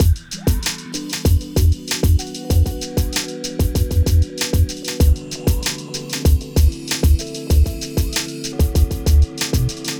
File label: Loop